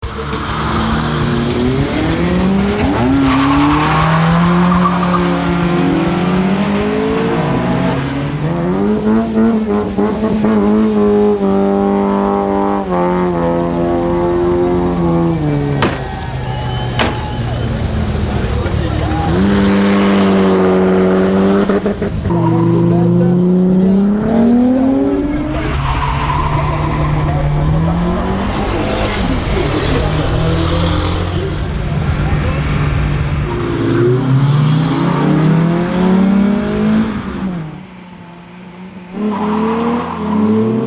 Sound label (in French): Différent jacky au volant, bruitage Transports